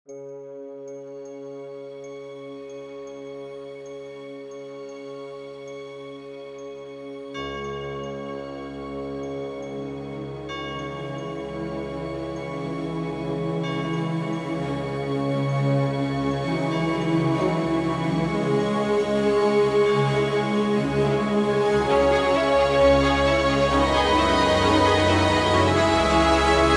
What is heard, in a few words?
Orchestral